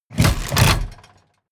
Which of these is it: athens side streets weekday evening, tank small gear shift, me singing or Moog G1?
tank small gear shift